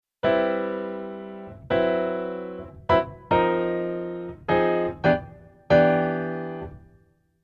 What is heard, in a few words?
Keyboard Pf Jazz Pf